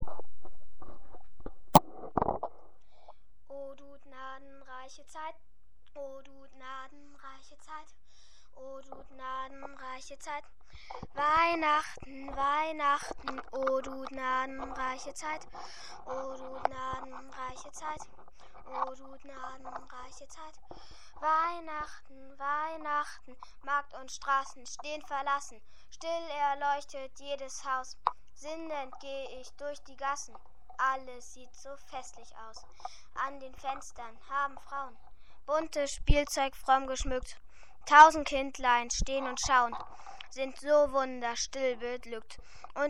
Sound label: rap